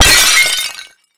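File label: destruction pot break; Explosions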